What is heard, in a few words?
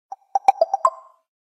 Galaxy S3 Tune